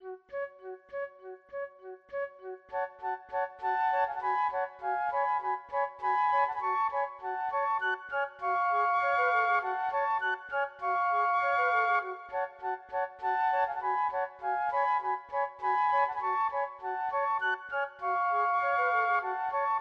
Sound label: flute trio